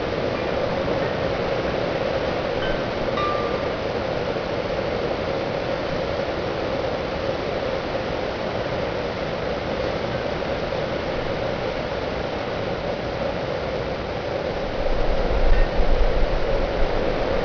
wind chime; quake2; kitsune

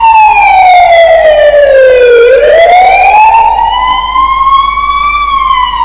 siren; quake2

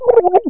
drown; quake2

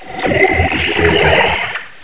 pain; quake2; ogre

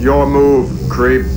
death; quake2; robocop

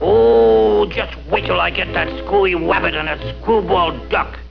death; quake2; fuddbeta

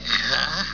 gurp; quake2; snork

